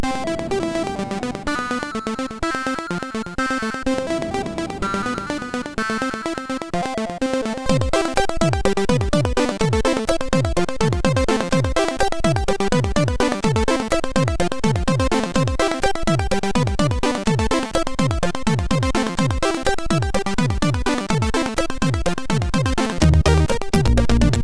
Vacation, MOS6581R4

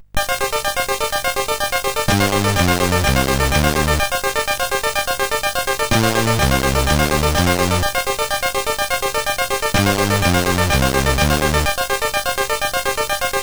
Remix, sid CSG8580R5

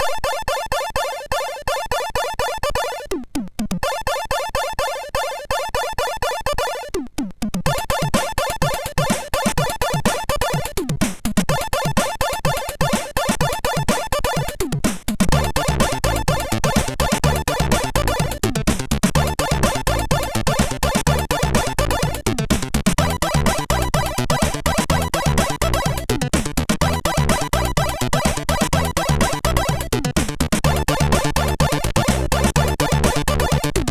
techno, MOS6581R3

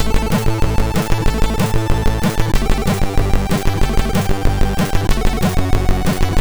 sid MOS6581R2

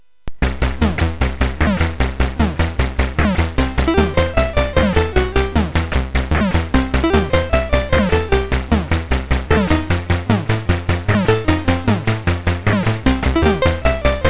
MOS6581R3; hvsc

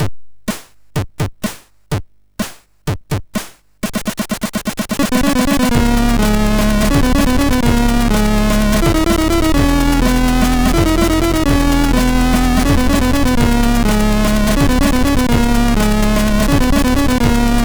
Heavy Metal, MOS6581R3; Heavy Metal Suxxx

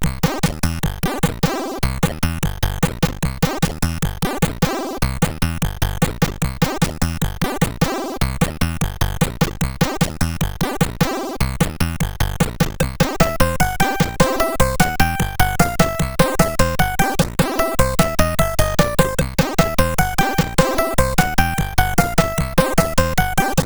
sid, CSG8580R5; Total Refill

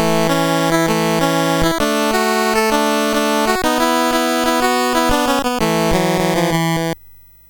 sid MOS6581R3

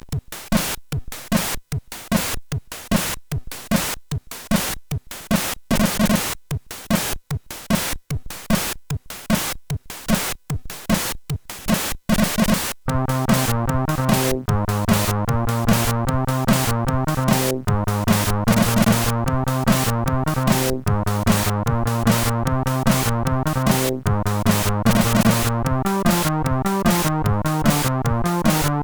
Bass

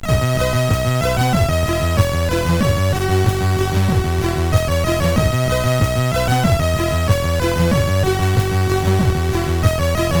Happy, CSG8580R5

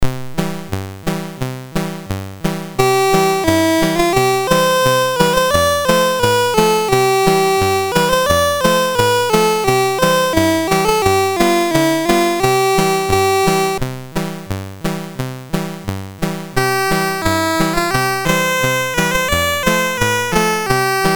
Christmas Album BASIC, Commodore MOS6581R3; C64

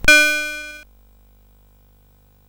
BASIC, CSG8580R5

